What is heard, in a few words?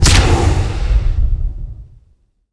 rocket jumper explode; tf2classic